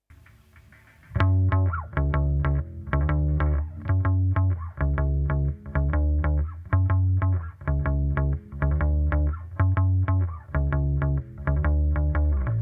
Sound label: rhythm